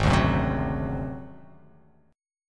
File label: G sharp 7th Flat 5th G sharp down, chord